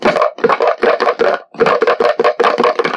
short rhythmic bucket shakes

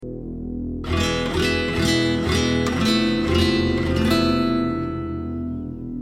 dramatic guitar; gankutsuou

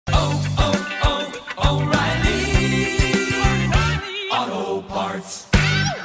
jingle store opening; oreilly auto parts

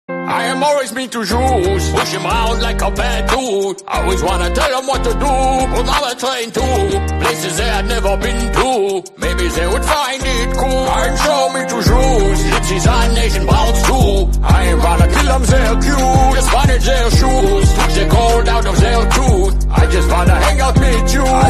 fun rapping

